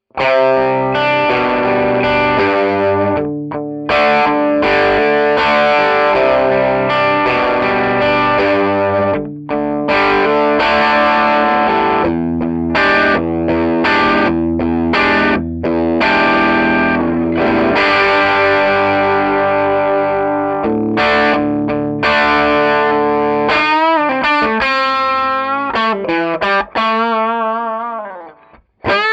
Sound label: crunch; schwarzbach RedZoneH